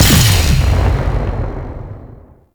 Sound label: Mounted Shockwave Ability Cast; Warframe; Duviri; Dax Mounted; Abilities